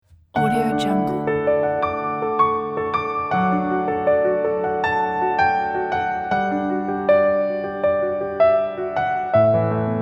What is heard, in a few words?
spring piano